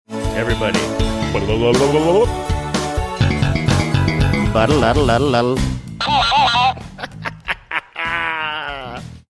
talking hamster toy saying everybody and all three singing funky bass